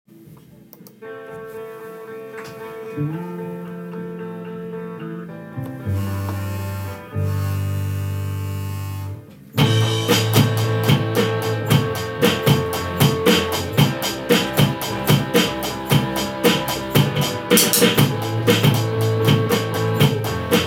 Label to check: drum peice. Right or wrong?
Right